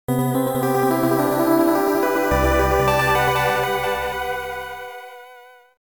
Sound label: Logo